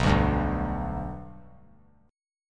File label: B Minor Sharp 5th; strummed; JGuitar